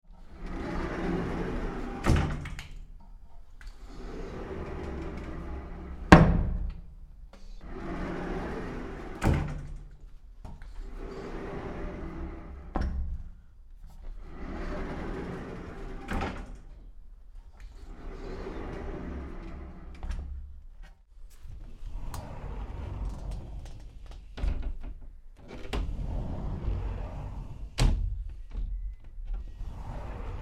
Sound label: Sliding Door; Tom Hutchings